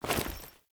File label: Female Foley Action Default Melee Heavy Swipe, Default Clothing; Characters; Player